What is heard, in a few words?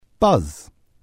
buzz; bbc